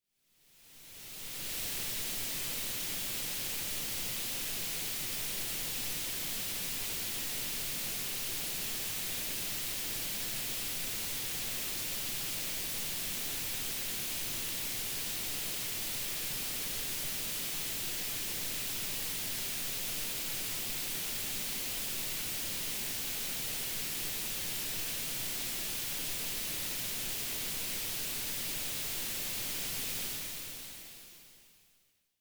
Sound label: pink noise, loudspeaker cables; group test loudspeaker cables 2013; atlas cables mavros